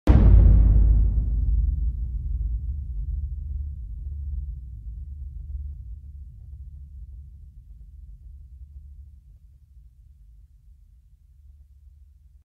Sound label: Boom